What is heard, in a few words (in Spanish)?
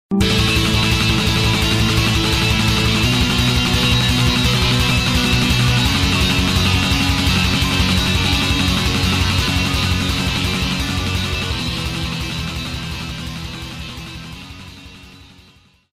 musica